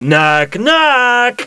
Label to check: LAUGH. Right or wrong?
Right